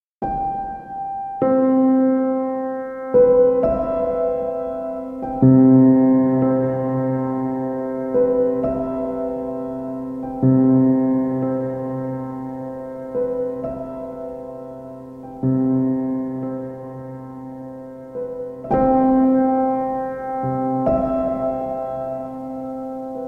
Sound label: Piano Sounds